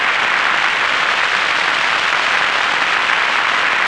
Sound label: RAIN; Zero-G Ambient 1; THUNDER+RAIN